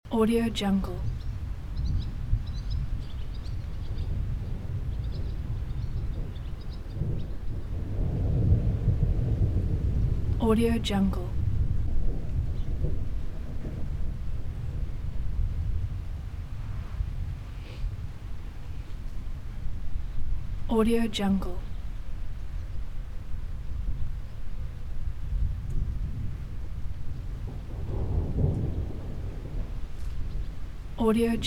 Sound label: light rain and distant thunders before storm loop; باران کم و رعد و برق; nature sounds